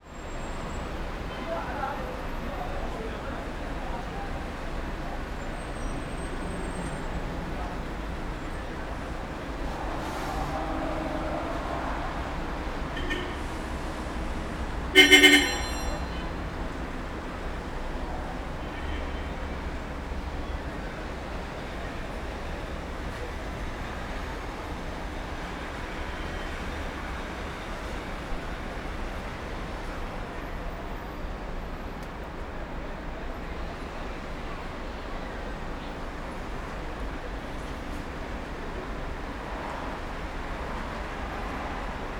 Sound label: Istanbul, Traffic, City ambience, Car horns, Taxi station; Sound Ex Machina; SOUNDS OF ISTANBUL